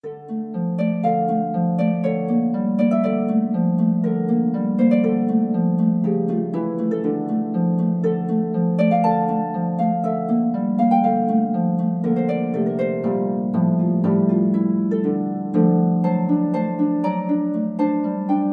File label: hungarian dance, harp